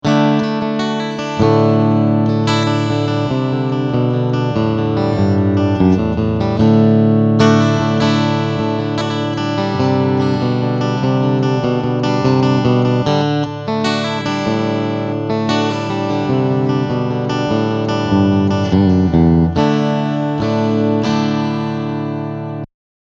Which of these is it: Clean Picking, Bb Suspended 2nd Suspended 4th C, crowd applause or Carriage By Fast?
Clean Picking